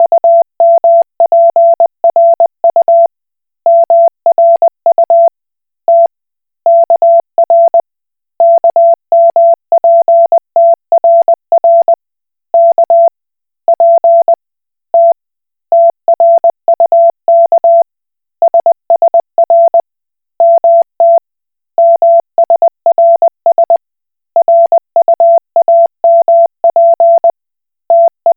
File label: Koch Morse